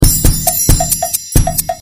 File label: salsa